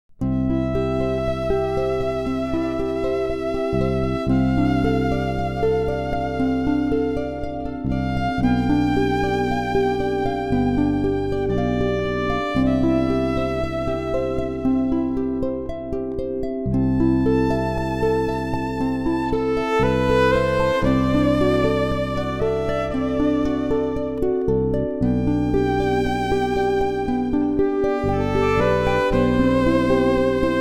Ave Maria Prelude in C Duet, Electro-Acoustic Harp + Electric Violin; Bach